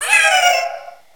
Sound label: laugh; ML BOWSERSINSIDE; Dark Fawful